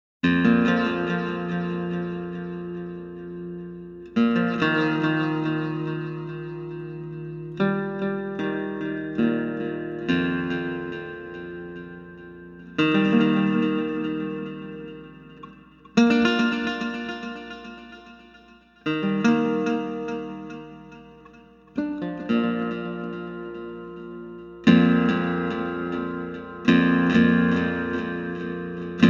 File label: fanfare